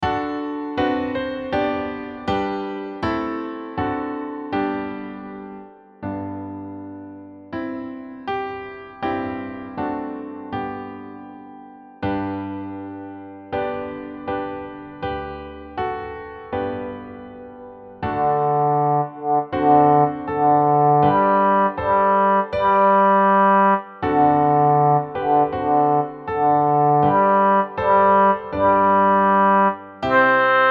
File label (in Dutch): tenor; the captives hymn; luxemburg